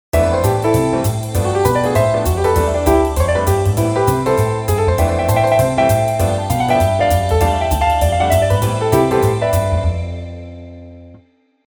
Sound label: short jazz